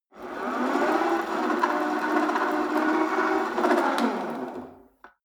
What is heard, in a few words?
rope trainer pull sequence medium, fitnessstudio; sport freizeit